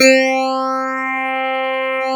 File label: Clavinet C4; USB Soundscan vol.09; AKAI